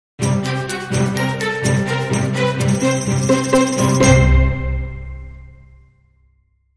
Dramedy Sting, Short

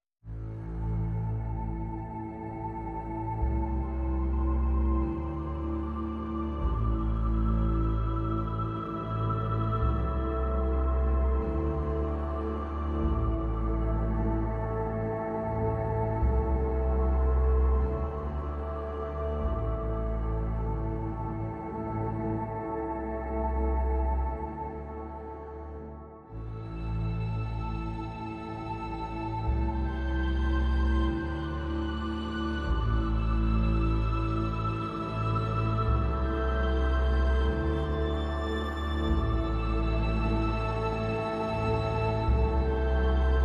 Synth Pads Mix

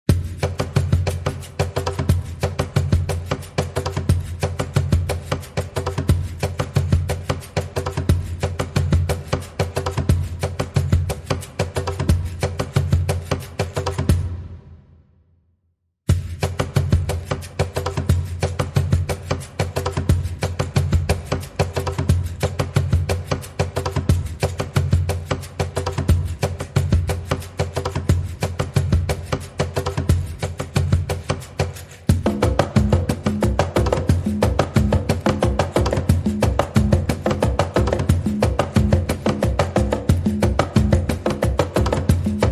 Percussion Only

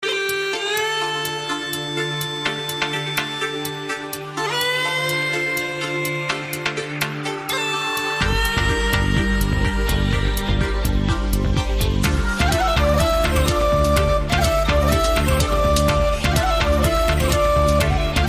Celtic Trance

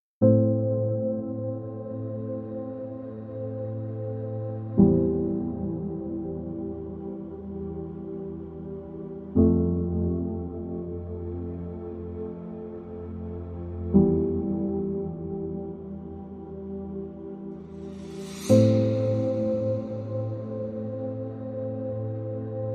Only Ambient Pads

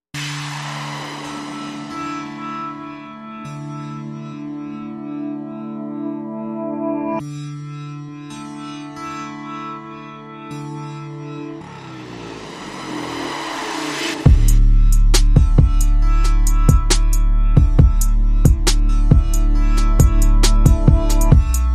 No Melody No Rhodes Bells

